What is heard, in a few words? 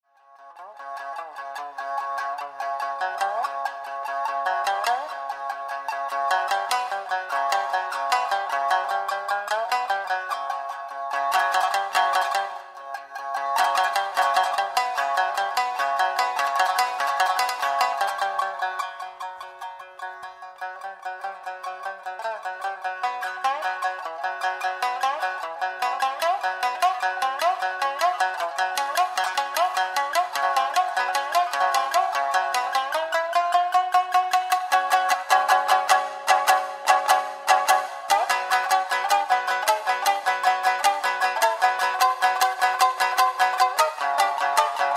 shamisen; 三味線